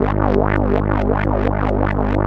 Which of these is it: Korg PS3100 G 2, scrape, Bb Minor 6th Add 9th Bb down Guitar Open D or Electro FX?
Korg PS3100 G 2